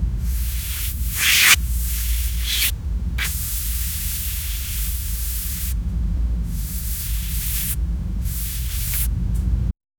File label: gentle rope sliding sound